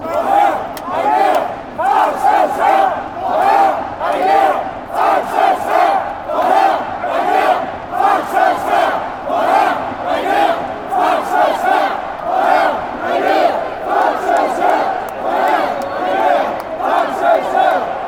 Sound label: randers chant, fanchants